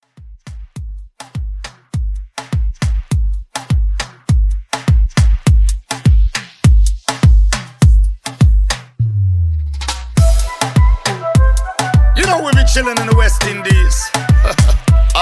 Moomba 102

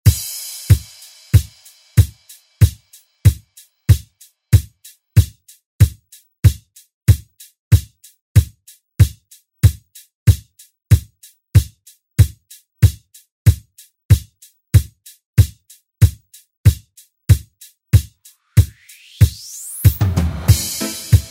80s Redrum; DJ Mhark

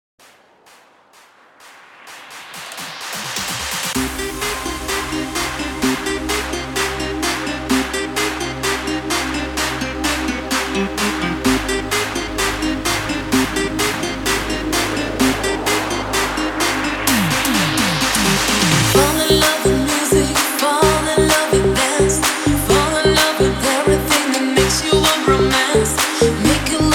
128 BPM

